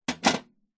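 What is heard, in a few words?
industrial wrench adjustable plastic handle set down metal tool box; zapsplat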